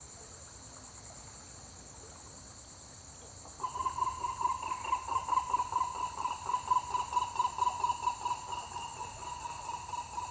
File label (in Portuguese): Coro coro e saracura de 3 potes cantando; aves; Coleção Sonora do Cerrado